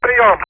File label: sinus file